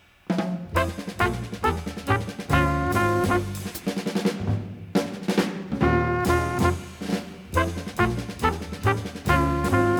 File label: Polyfonic signal; Separation of Sound Sources by Convolutive